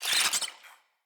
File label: Infested Ashen Vocal Pain; Enemies